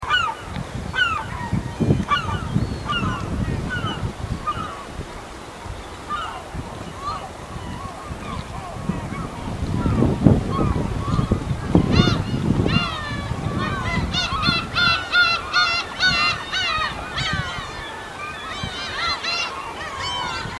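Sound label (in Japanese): kamome & umineko, 野鳥の声; ウミネコ; 音声は前半がカモメ、後半がウミネコです。; ウミネコは近くで見ると意外と怖い顔をしており、体も大きいですね。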